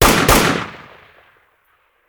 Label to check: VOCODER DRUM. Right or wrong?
Wrong